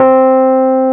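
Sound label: piano C3 f 73, Rhodes suitcase; Keyboards of The 60's and 70's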